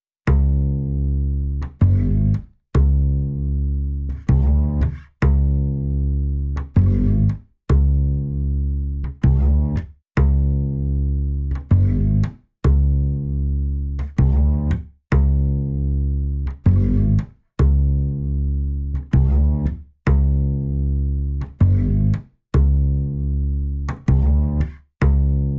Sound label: 97 BPM F# Min Bass, West Coast; Melodies; Loop Stems; Cymatics; GHOST (Beta Pack); Alley